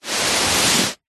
foley hand on carpet single rub

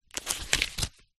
household book very small page turn; zapsplat

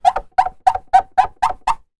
foley wood polished rub squeak multiple; zapsplat

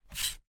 household dvd disc slide on table to pick up

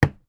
industrial sealant tube put down on table

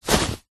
foley clothes sports shorts pick up from carpet; zapsplat

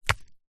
small stone drop forest floor; zapsplat; foley

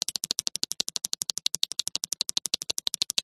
cartoon insect scuttle fast

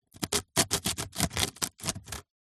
foley string movement scrape over wood; zapsplat

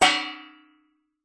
impacts metal tray hanging suspended hit resonate

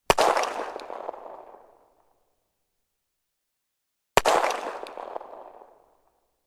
gun 1911; weapon; still north media

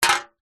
can soda empty set down wood floor

